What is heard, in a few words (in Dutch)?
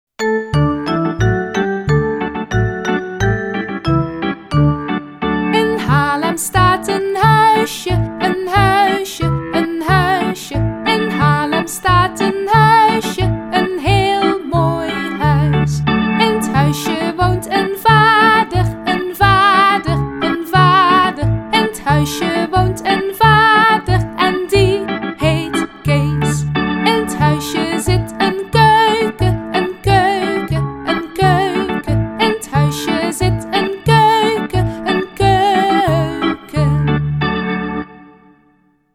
een huisje met zang